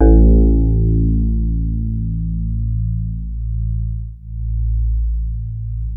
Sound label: DX7 MID2 A1, E-PIANO; Music Store